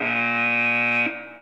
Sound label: klaxon; Source Engine; Construct Beta; cnsmod